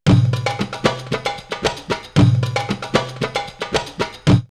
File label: Desert Beat 114, drumloops World Ethnic; X-Static Goldmine